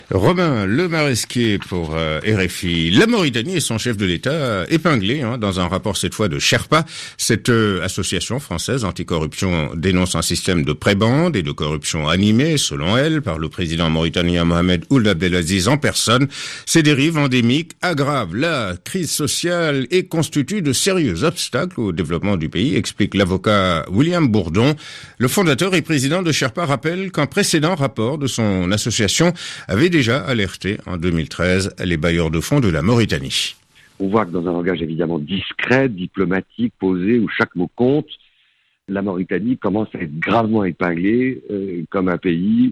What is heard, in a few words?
Bourdon